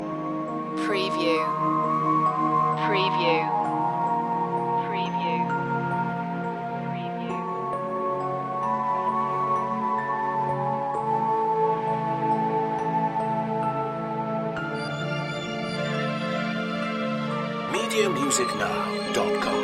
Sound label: ambient clouds loop